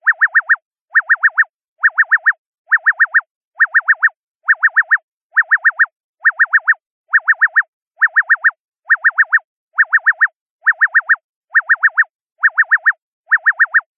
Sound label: emergency alarm small siren